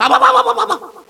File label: owwowowowowow, Echo inside caves, underground; DS; ML BOWSERS INSIDE; Luigi